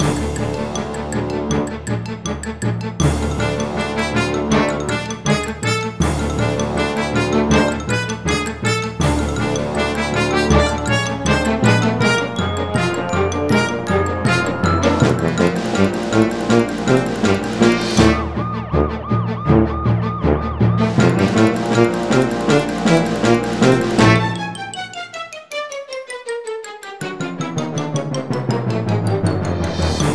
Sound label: Comic or Childrens Loop High Intensity